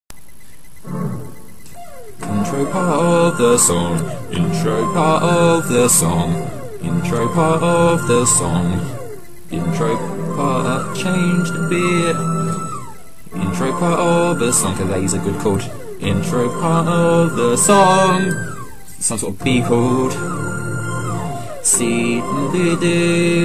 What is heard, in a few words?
synth